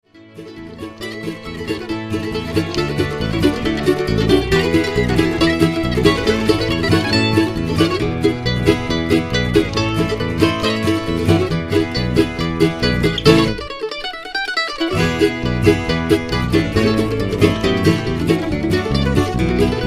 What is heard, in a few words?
banjo special